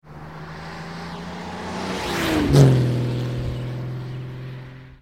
ac bristol db

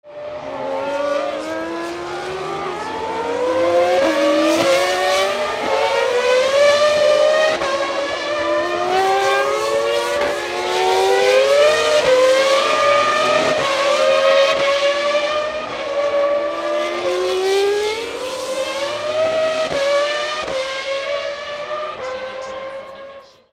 f1 medley

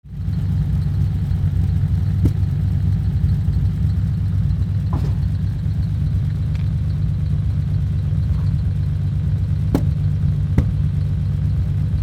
ferrari 365 idle

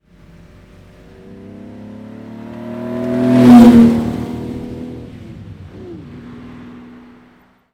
ferrari 365 drive by

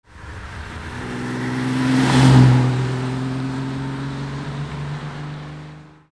ferrari 250 lusso

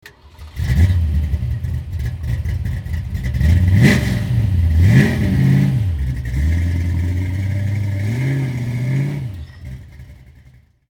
Mustang GT350; 2003 Skope Classic; Ruapuna Raceway, Christchurch, New Zealand

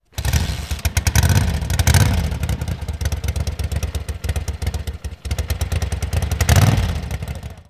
Ducati 750 start

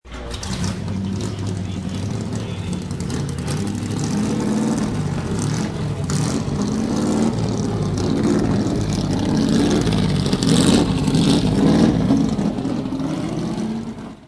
allard jr rev

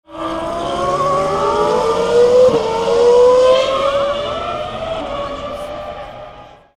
F1 Ferrari, engine

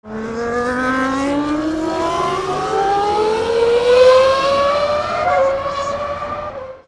f1 honda

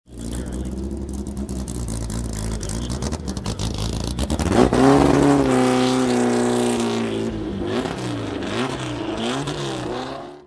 porsche spdstr rev

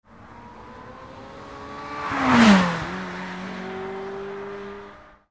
ferrari 355 drive by

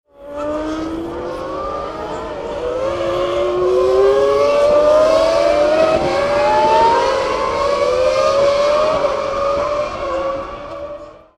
F1

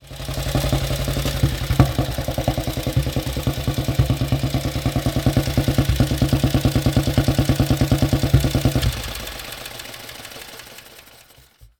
Norton Model 18

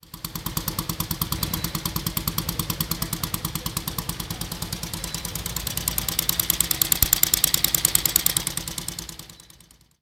Early Norton

